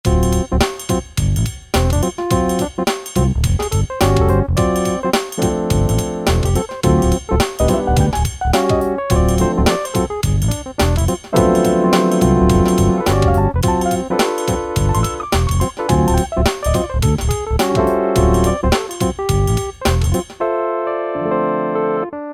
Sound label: Wurly, E-mu PK-6; Reel